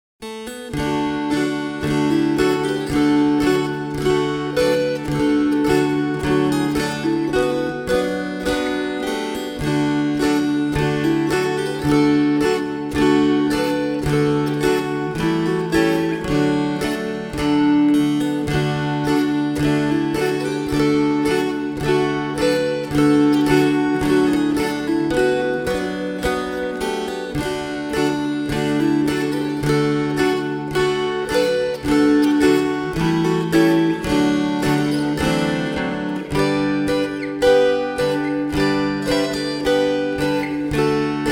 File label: March slow